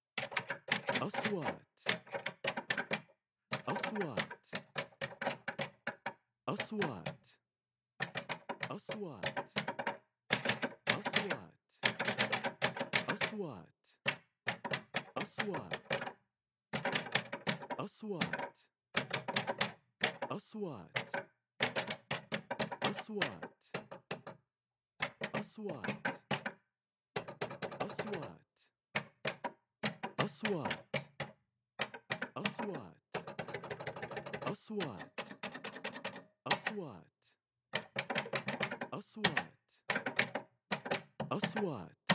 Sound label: typewriter old